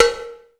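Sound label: POWER KITS, cowbell; 90 sSampleCDs; Crypton - DrumBase5000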